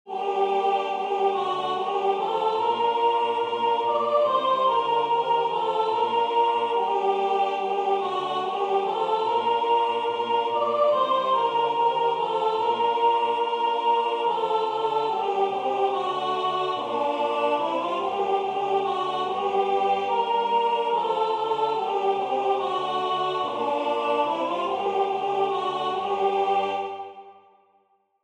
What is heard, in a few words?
pavane S; arbeau; Belle qui tiens ma vie